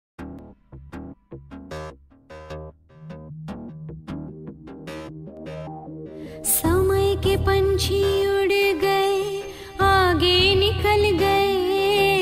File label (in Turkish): Female; Hint Muzikleri; Kordugum Dizi Muzigi